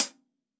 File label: HH, HI HATS; Basement drums vol 2